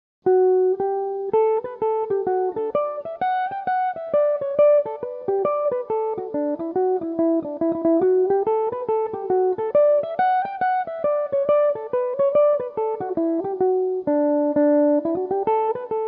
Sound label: an comhra donn hornpipe D major, irish mandolin; Belmuse EM-190 electric mandolin; Recorded for the Mandolin Cafe's Song-A-Week Social Group